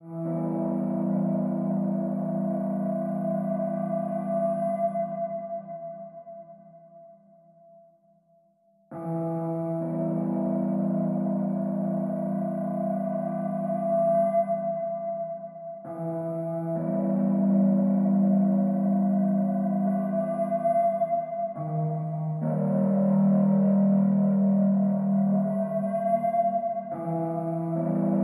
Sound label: Melody Only